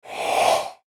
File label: Short Swoosh